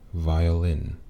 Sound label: violin